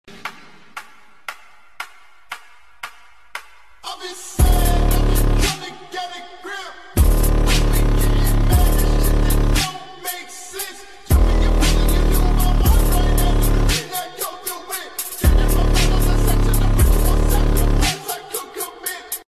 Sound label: Tik Tok Ringtone